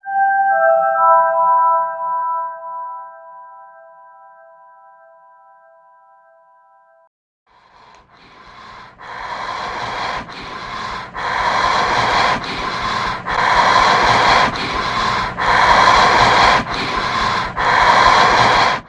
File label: horror; mujik